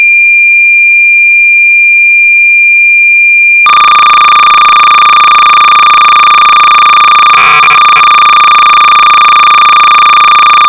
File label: BASIC Sorcerer 300 baud; invrt